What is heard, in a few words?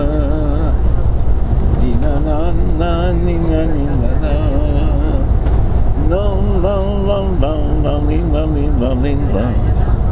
niggun